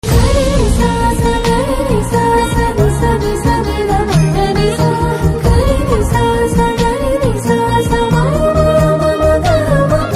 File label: BGM Chorus Ringtone